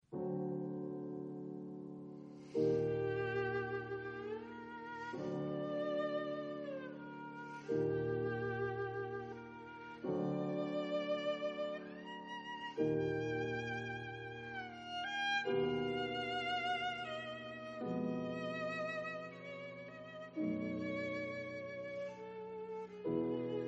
Nocturne violon piano

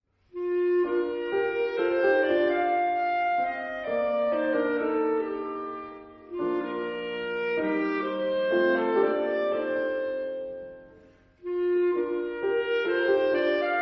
Clarinette Theme

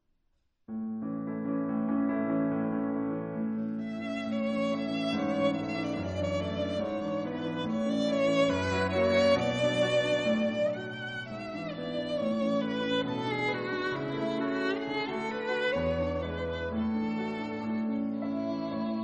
Romance violon piano

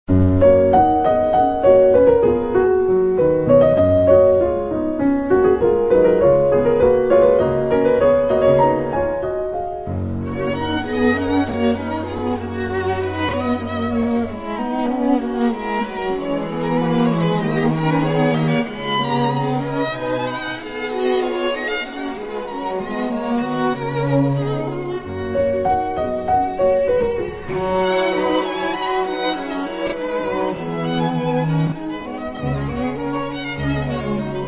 Quintette; Quintette en fa dièse mineur, piano et quatuor à cordes; (Paris : Heugel, 1923); 3. Allegretto grazioso

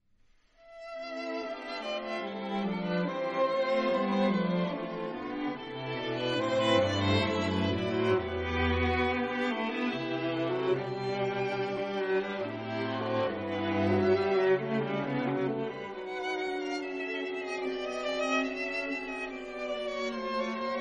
Quatuor la

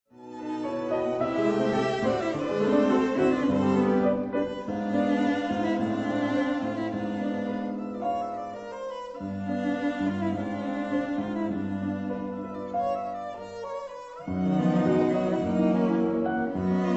Quatuor piano; Paris : Heugel, 1946; 2. Allegro assai

